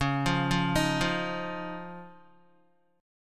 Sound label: C sharp Major Add 9th C sharp down Guitar; C#add9 arpeggiated; JGuitar